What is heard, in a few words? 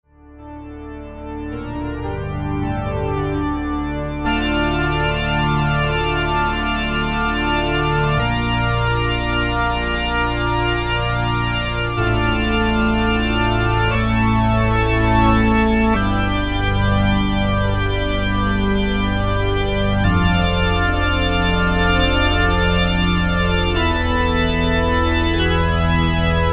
Pipe Organ; Factory A